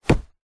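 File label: foley clothing jacket hit punch sweetener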